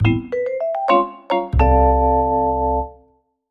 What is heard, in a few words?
Jingle Salon Opening Sports; clubhouse games 51 worldwide classics 2020 switch gamerip